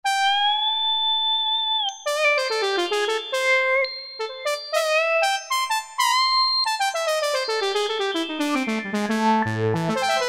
Echo Bell, Yamaha QY70; XG Voice examples

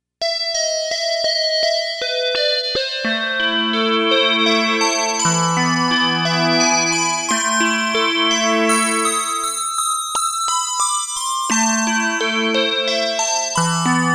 Carillon

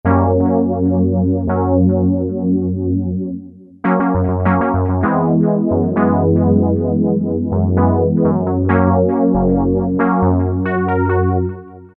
TremoloSynth, Korg MS2000; Factory Patches

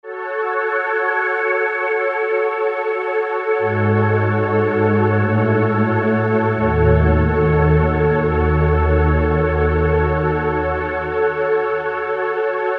Velcropad

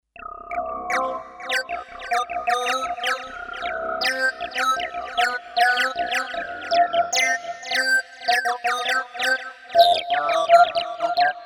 Kawai K5000S; Cumos

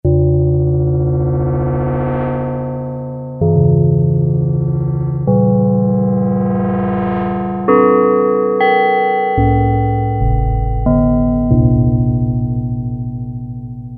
GONG, Yamaha DX7

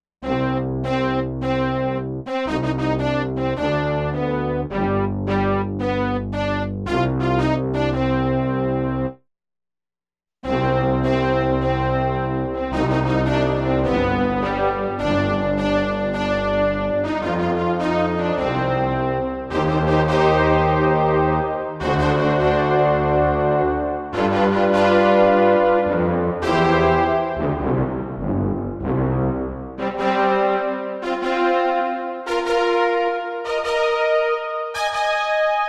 MEDIUM HALL

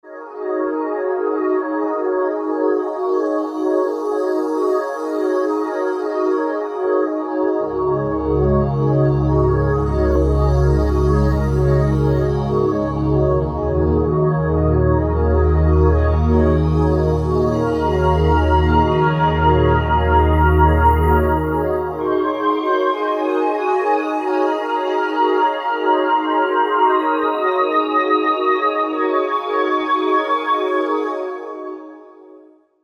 Aqua Phonic, synth

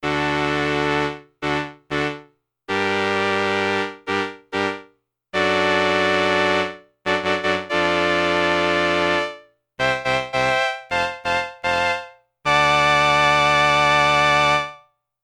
BRASS with vibrato, Roland RS-202

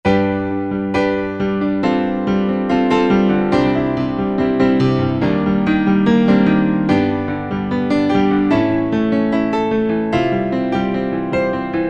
Piano, Korg 05RW

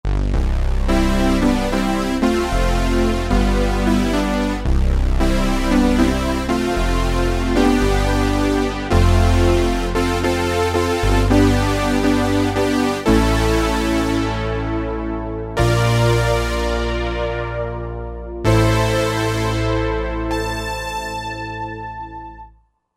Saw Mass; Roland JV-2080

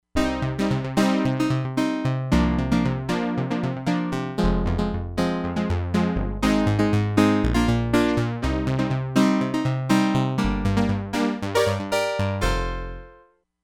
PULSE DECAY; Crumar Bit 99; Factory Programs

